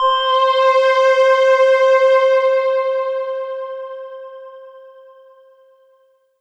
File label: Yamaha V50 Strg Chime C5